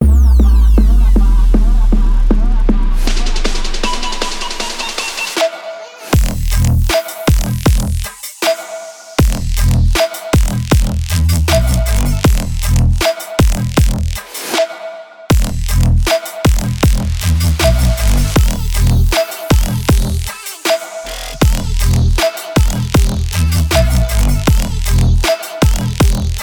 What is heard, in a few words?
gap trap amp dubstep